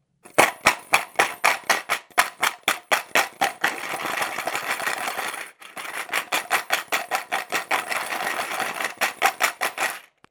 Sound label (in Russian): zvuk melochi